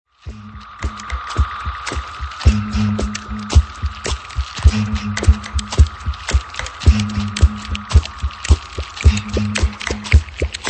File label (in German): gemueseorchester